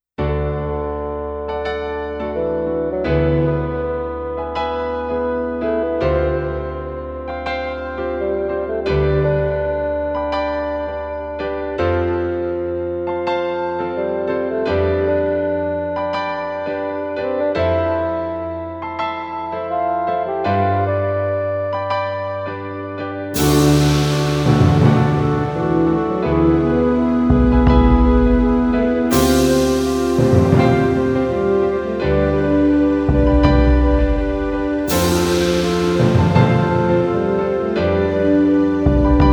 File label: Fanfare